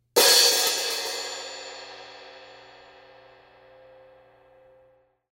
14 K Custom HiHats Open Hit, cymbal

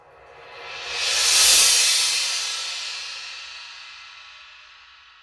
FX 18in Spiral Trash, cymbal sound

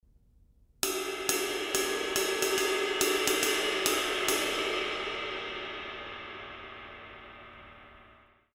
K Con Med Thin Ride Low Ride Pattern, cymbal

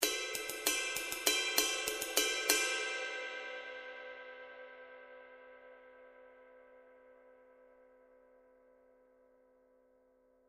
22in Custom High Definition Ride

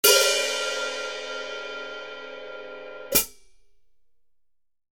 14in Mastersound HiHats, cymbal sound

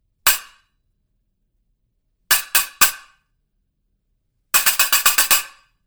Stacker HiHat, cymbal sound

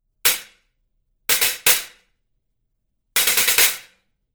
Stacker Pair, cymbal

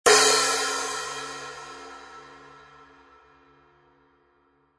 15in Latin Multi Crash Hand Stick

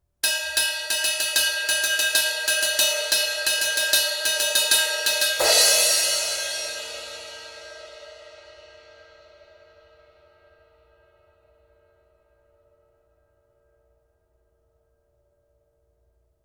FX Multi Crash Ride, cymbal; Azuka El Sonido

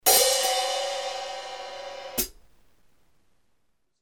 13 Hybrid Hats, cymbal

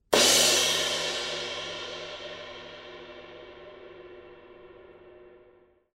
K Con Overhammered Ride Crash, cymbal sound

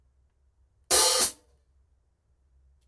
16in Light HiHats, cymbal

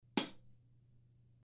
S Hats Chick, cymbal

